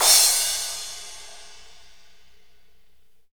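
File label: cymbal crash, Roland; Northstar; Drumscapes Roland; Cymbals 1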